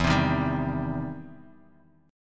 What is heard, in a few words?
E Minor Major 7th Sharp 5th, E, down; EmM7#5 strummed